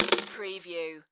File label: Jewelry Set Down; sound-effects-bible